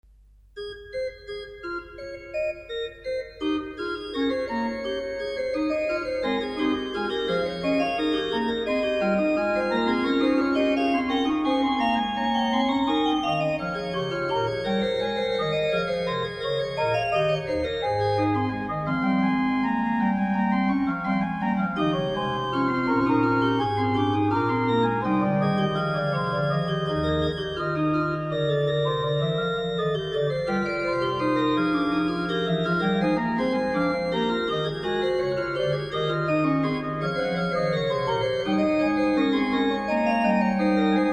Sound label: Mass for Organ Gloria Allein Gott in der Hah' sei Ehr'; Bach Organ